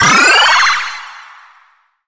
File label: Power Max; Pac-Man Championship Edition 2 Plus